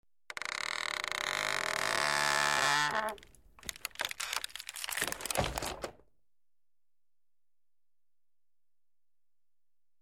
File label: Opening creaking wood door